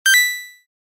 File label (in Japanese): アイテム入手 レトロ